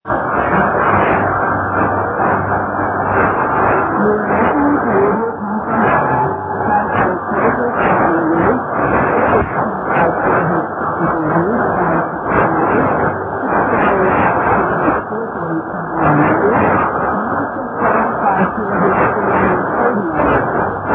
9675 kHz

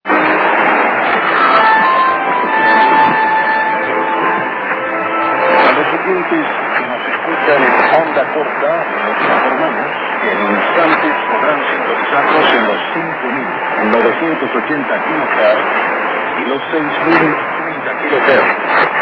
5980 KHz; Radio Marti

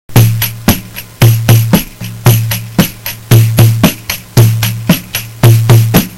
pandeiro

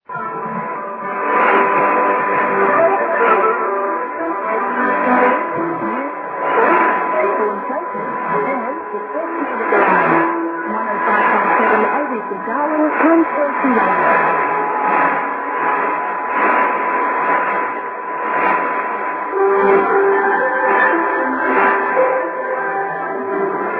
2485KHz; ABC

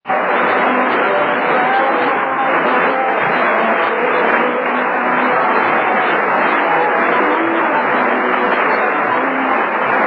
biak loop

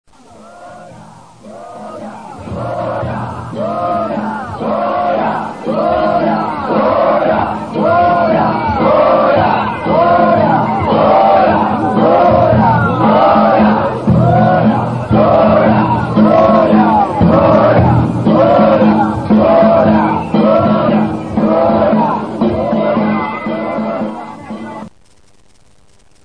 danjiri sounds

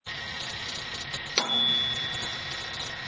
oven toaster; 着信音やアラーム（目覚まし）音に使える、着モーションとして; FOMA

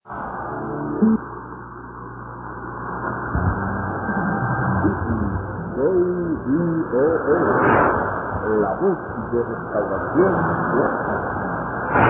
17775kHz; KVOH

